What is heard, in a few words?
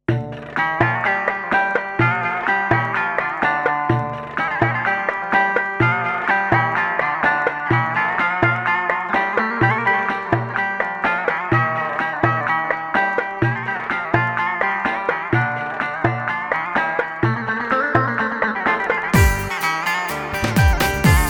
tyros3 elektro